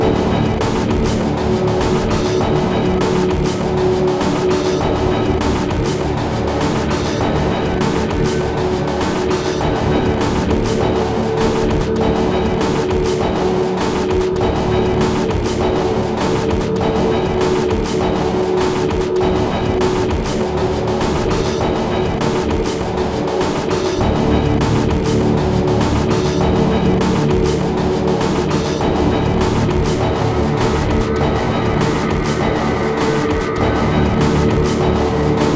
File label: newsong loop; svencoop